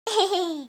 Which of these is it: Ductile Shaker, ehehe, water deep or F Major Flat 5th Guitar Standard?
ehehe